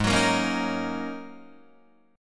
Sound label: Ab 9th Suspended 4th Ab down Guitar DADGAD; Ab9sus4 strummed